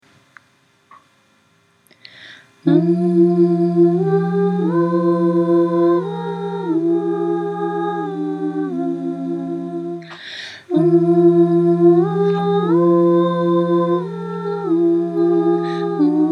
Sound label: Jap lullaby